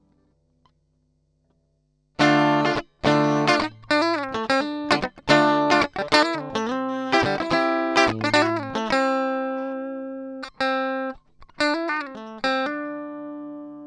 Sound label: Amp